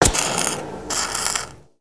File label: Welder Pop; foley